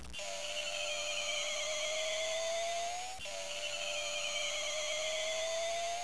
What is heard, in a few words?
squeal